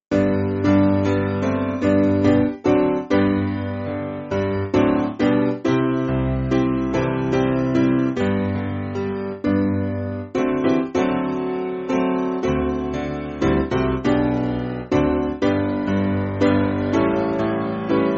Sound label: Piano, church music; I Come To The Garden; Miles